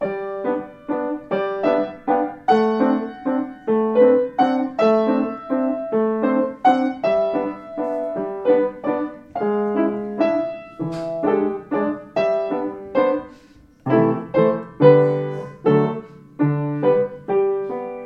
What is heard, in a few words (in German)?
klavier